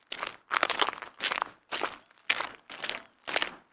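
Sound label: sounds of footsteps on ice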